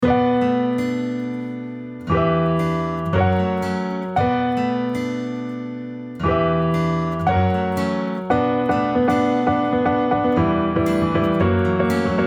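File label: piano and guitar solo in ballad piano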